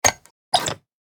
Office hole punch paper vintage

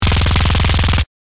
Special forces submachine gun full auto fire; gfxsounds

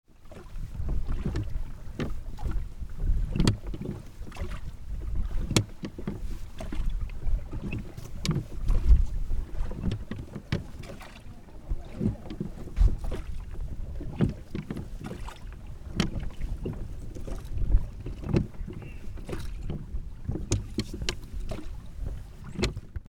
Boat interior cabin perspective idle loopable

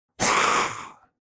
Demon beast imp monster sigh surprise

